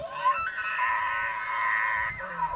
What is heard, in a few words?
big bull bugle, elk